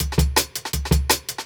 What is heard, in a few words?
Jungle Breaks